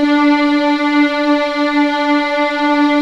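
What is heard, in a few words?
D4 legato forte, violins; East West; The Ultimate String Collection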